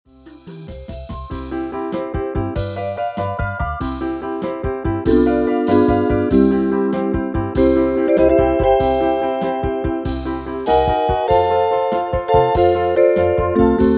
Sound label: Slow Rock, Casio CT-370, Rhythms